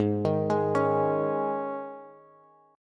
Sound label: G sharp Suspended 4th G sharp, Bass; G#sus4 arpeggiated; JGuitar